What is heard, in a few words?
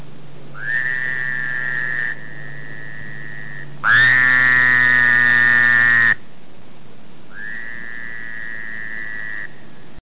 fowlers toad